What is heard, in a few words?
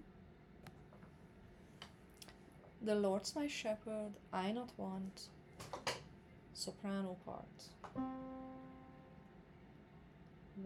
SOPRANO